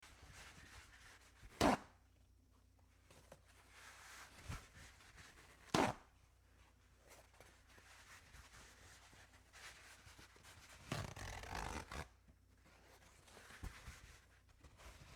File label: paper towel rip